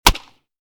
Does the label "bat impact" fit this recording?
Yes